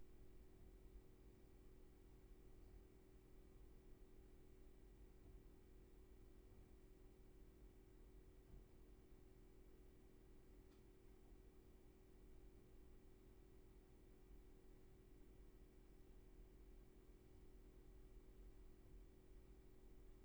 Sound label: room tone; Gregor